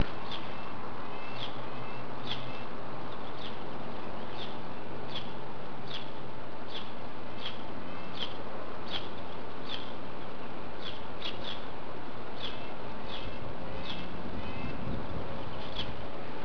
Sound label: bird